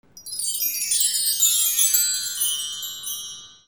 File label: BELLS